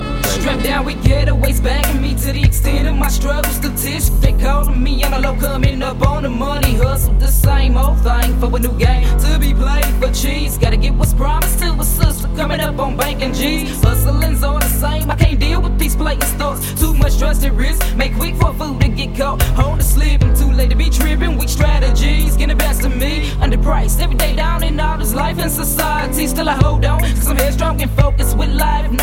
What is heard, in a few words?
ghetto blues